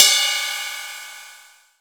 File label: JAZY CY, MONO RIDE